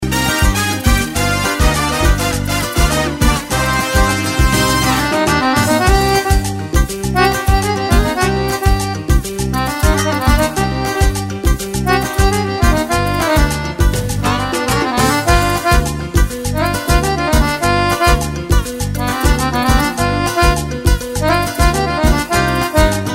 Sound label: cumbia